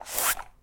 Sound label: take off headphones